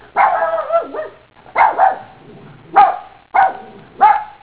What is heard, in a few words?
PUPPY